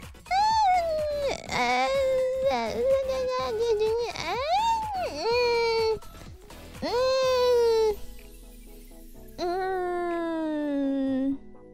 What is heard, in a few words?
hesitation noises